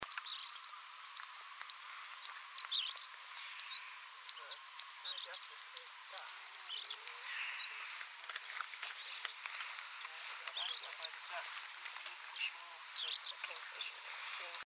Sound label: madagascar lark